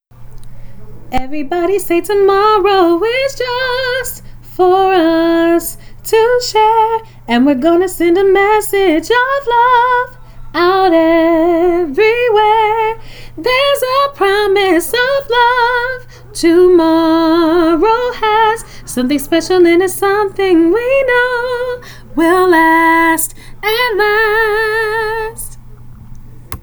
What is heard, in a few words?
(Soprano)